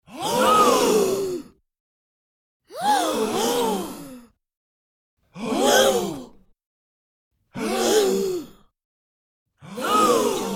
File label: crowd gasp